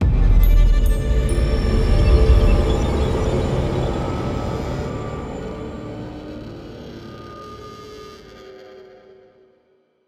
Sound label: Music Pred Success; Harley Police